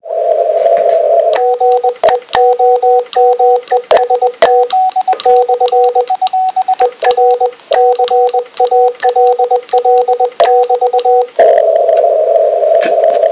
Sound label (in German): cw frequenzsprung